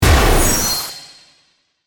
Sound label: Hit Complex Phase; Hits; Sound Design; Blast Wave